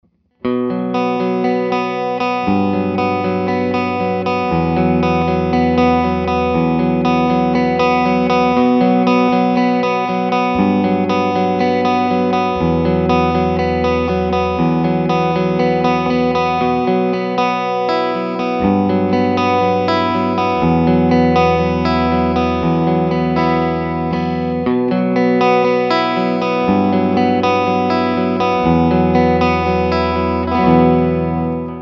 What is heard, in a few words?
Black and Blue clean